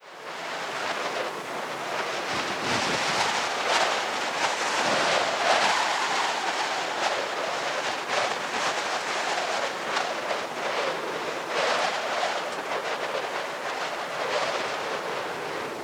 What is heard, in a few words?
Sierr Wind